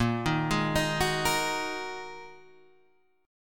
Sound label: A sharp 7th Suspended 4th Sharp 5th down Guitar Half Step Down